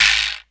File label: QUIJADA, PERCUSSION; Crypton - DrumBase5000